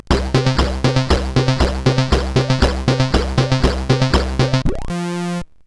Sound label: Fire Breath, CSG8580R5